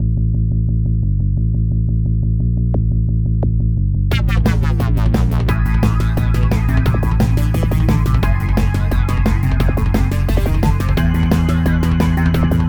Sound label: suspense; white lie ost